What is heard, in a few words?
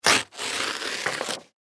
Textbook Page Rip, Textbook Page Rip Out Long; Foley; Books; Sound Effects:Offices; SoundEffects-Blastwave